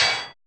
PAIN PERC, Drums; Drums In Your FACE!; FILM KIT2

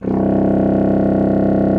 C1, bassoon; Xsample VOL-6

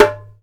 DUMBEK, ETHNIC PER; AKAI S-Series CD-ROM Sound Library VOL-5

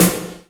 WET 909 SN; Masterbits - TR-Minator

